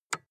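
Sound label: sports car interior lightswitch soft; verkehr transport; autos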